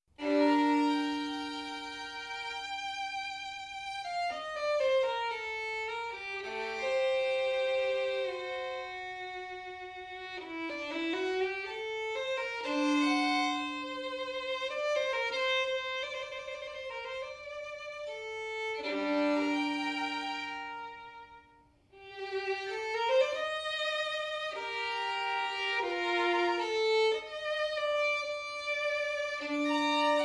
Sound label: violin before